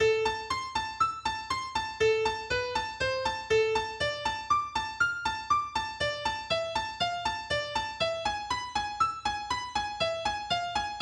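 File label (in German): Allegro in A Moll Solo Akustikgitarre; Giuliani; Melodie-Solos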